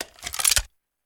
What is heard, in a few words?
pistol equip; Shattered Horizon